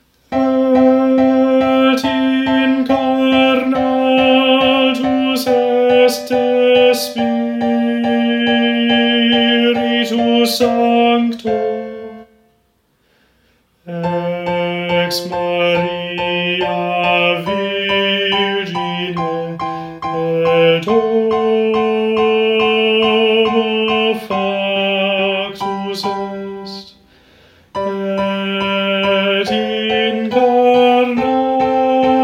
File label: Tenor